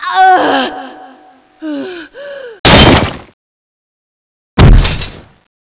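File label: die, alien controller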